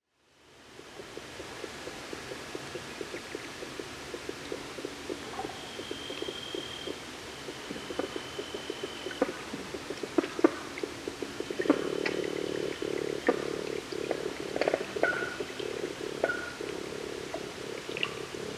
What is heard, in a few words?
Black tailed nativehen